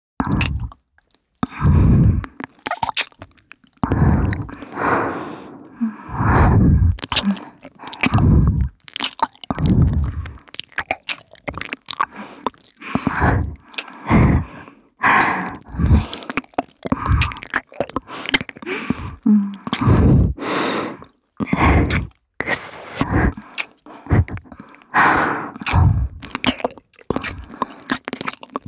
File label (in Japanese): キス＆イジワル耳舐め; 渚